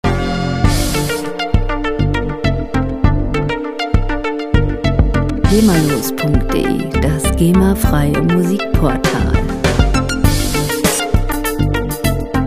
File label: junglist